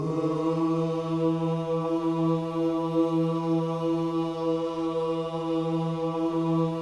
OOH E3, Gospel; ILIO; Vocal Planet

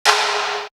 Tom, Drum One Shots

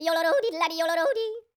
YODEL, World Voices; ILIO; Vocal Planet

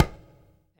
KAT, TABLAS, PERCUSSION; AKAI S6000 CD-ROM - Volume 1

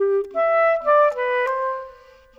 Clarinet; Sonic Foundry (Sony Creative Software); Orchestral VOL-2

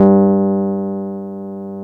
G1, Keyboards Old School; USB Soundscan vol.09; AKAI

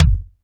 TEK.K, TECHNO; 90 sSampleCDs; Crypton - DrumBase5000

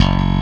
THMPNG F#; Analogon

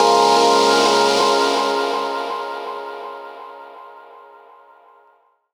PAD SHUSH FX; Rawcutz - Nu Forms; Gbm

